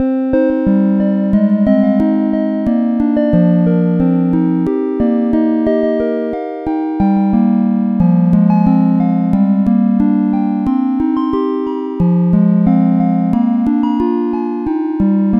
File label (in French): harpe